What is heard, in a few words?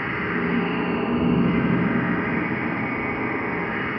sparse soundscape samples; ReLooped